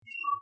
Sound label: communications end transmission, computer